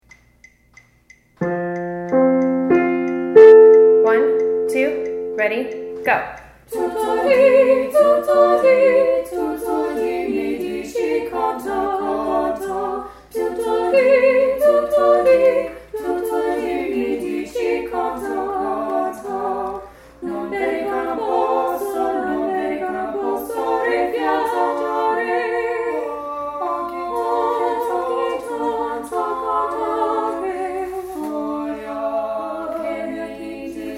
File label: bass audition, choirs